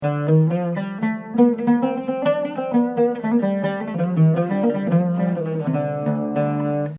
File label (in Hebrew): חוסייני, מאקם ומוזיקה ערבית